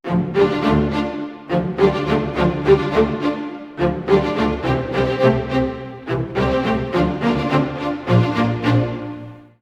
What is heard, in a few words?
105 strings, Orchestral arrangements, 105 D min